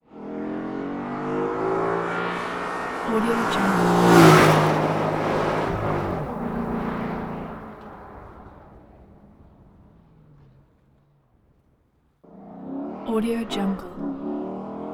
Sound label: maserati spyder car med pass bys; 2004; urban sounds